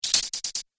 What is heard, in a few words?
Android Tech Parts, robot; Robot Sound Effects